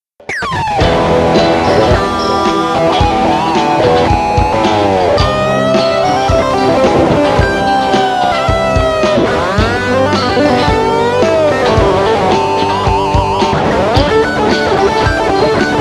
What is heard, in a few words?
Arp e, c, g, d sólo